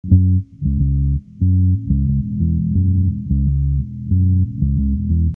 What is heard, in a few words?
bass